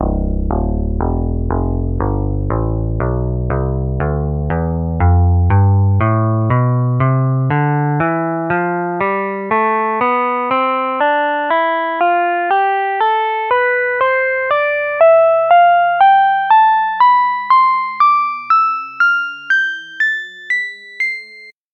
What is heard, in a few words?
jazz guitar tonleiter